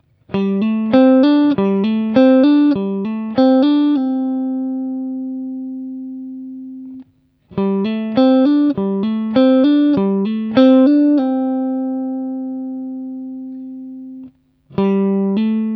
Hammer on and Pull Off Lick, guitar

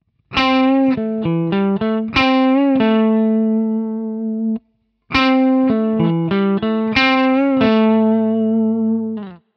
Minor 3rd Lick, guitar

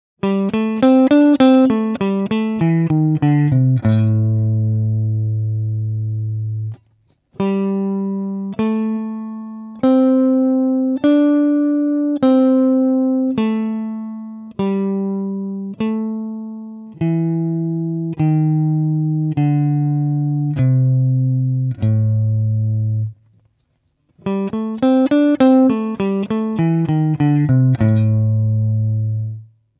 Lick, guitar

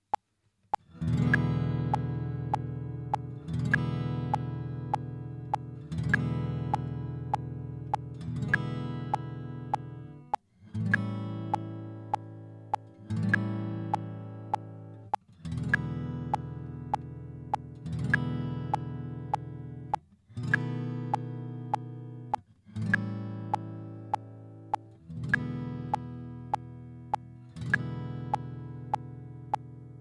E Blues Progression, guitar

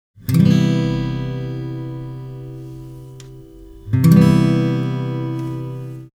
Cmaj7, guitar

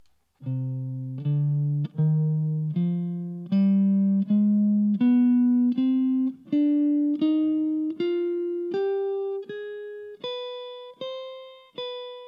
C Harmonic Minor, guitar

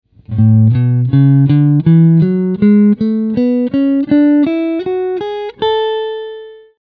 A Major Scale, guitar

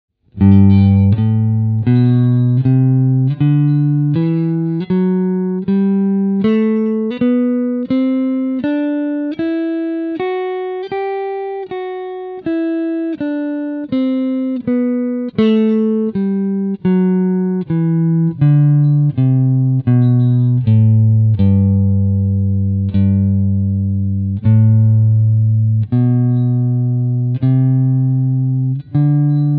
Major Scale, guitar

